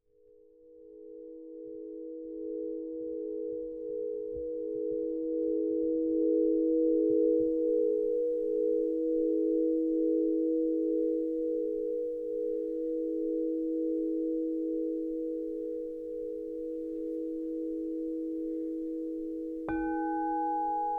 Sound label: Harmonised Healing Set, crystal singing bowls